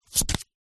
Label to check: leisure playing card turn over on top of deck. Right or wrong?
Right